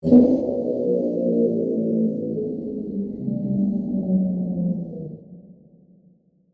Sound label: Production Element Title Transition Demonic